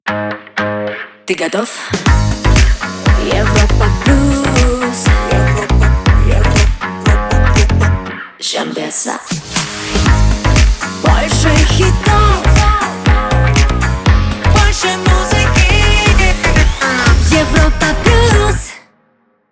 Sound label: Jingle; Europa Plus